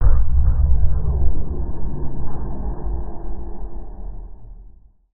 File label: SPACE FX 140; SpaceSounds